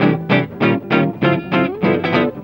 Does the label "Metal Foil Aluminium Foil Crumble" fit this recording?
No